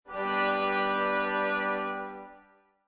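Brass Maj Chord, Brass section of the orchestra playing a major chord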